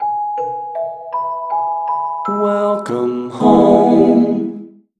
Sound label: jingle